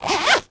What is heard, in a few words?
handbag zipper; foley